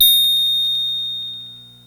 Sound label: VOY8 BELL HI, VOYETRA 8; Greytsounds VOL-1